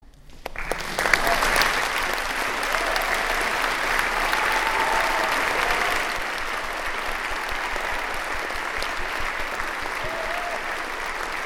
Folkensemble Hava Nagila Applaus

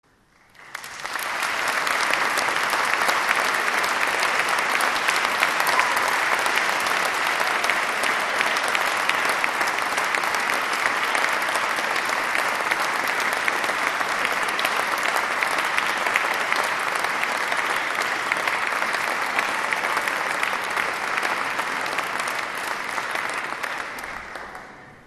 Applaus; Chor und Jubilaeumschor Cantus Iteratus Carl Jenkins

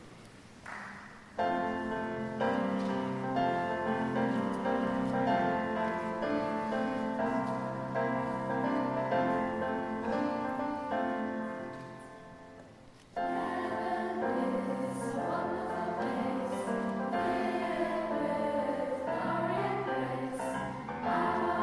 Unterstufenchor

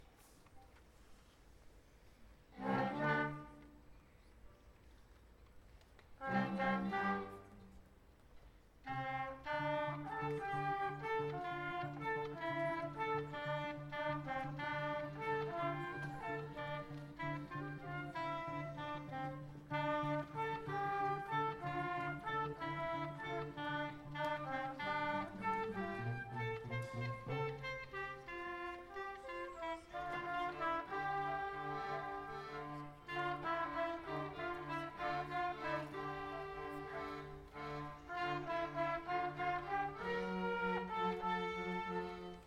Junges Ensemble

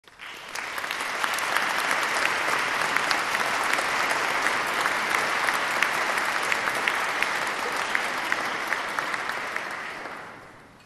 Applaus; BlechRIG The Earle of Oxfords Marche William Byrd

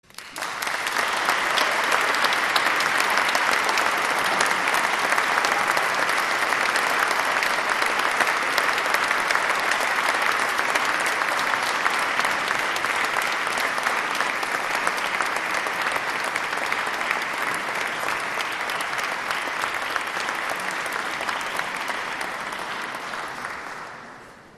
Applaus

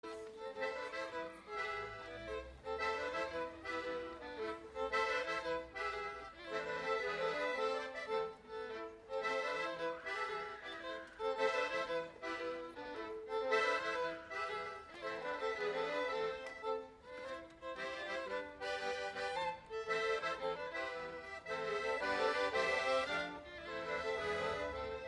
Folk Gruppe Jig; The Collaraine Jig

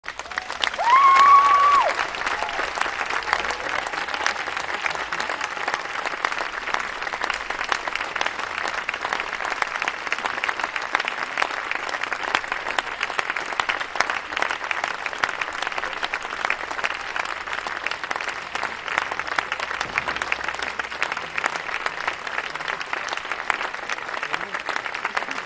Schlussapplaus

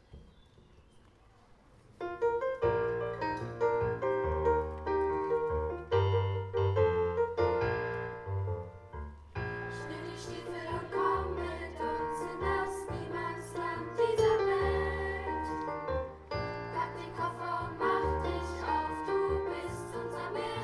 Unterstufenchor; Die Reise mit der Zeitmaschine

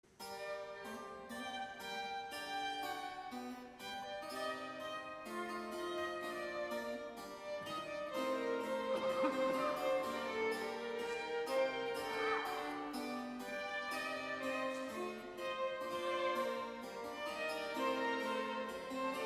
Kammermusik Triosonate fuer zwei Violinen und Basso continuo in g-moll grave

